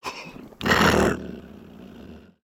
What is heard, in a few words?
Pets Lion Sleep; creatures; Lego